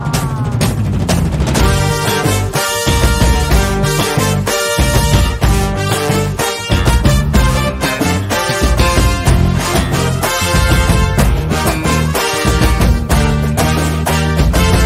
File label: Theme Music BGM; Roberrt; Kannada